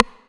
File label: M-Audio Venom Side Stick